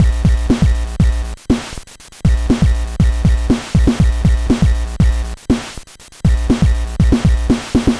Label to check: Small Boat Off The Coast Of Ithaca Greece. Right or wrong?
Wrong